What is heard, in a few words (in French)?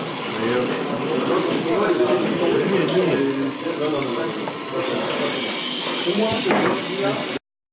Atchoum